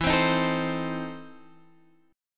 E Major 7th Suspended 4th Sharp 5th E down Guitar Drop D